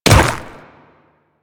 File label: Ice Step, Footsteps; Royal Guard